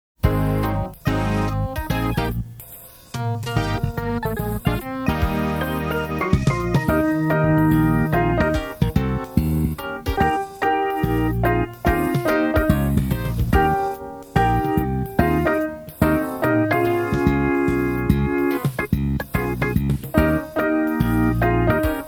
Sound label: Dont Rap It Bag It WITH melody fade